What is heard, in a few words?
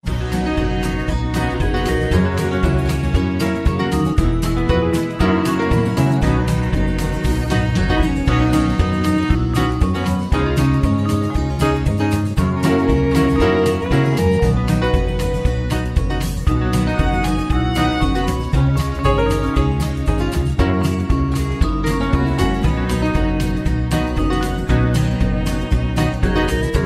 Low Key